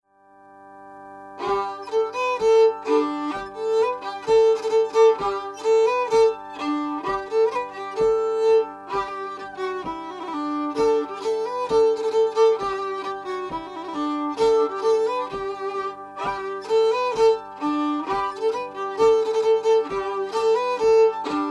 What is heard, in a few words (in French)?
mode franc